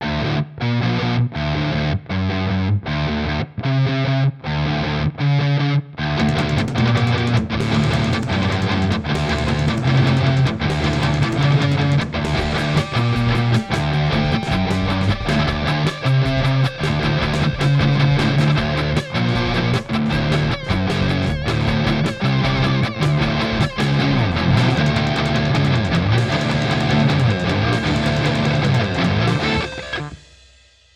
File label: Ripped Speaker Fuzz Saturated; EHX